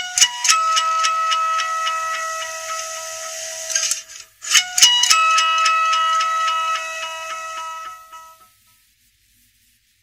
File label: Nokia Ringtone 7.1